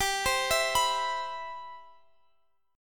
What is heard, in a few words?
C Major 7th, G, guitar chord